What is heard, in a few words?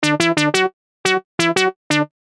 lead